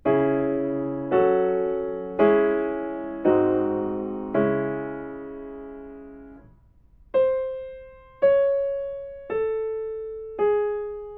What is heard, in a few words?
melodic fragments; ear training